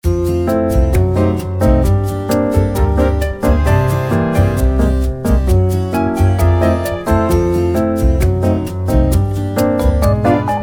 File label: cooking